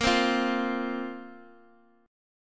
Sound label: A sharp Suspended 2nd down